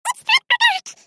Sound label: clock